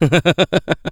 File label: laugh; Eternal Return; ENG